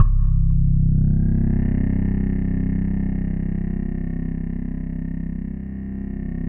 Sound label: PHASE, synthesizer bass; Synthesizers; Soli-Music; World of Synthesizers VOL-1